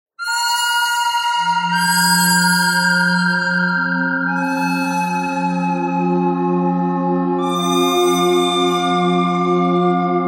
bird thread harmonic

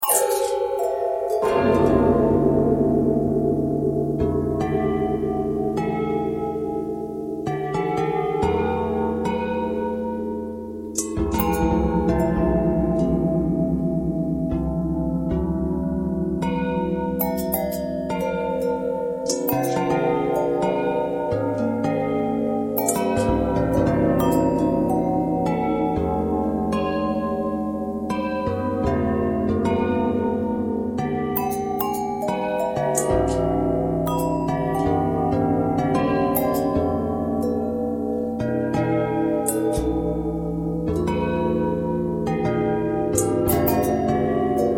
bell long bell bend twist, bells

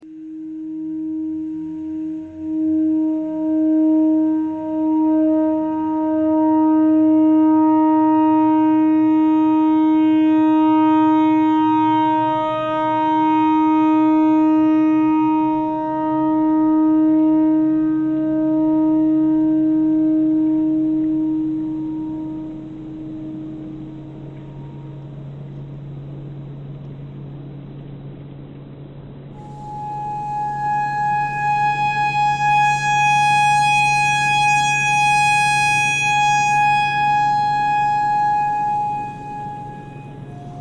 clarinet notes e tornado siren thread pentatonic

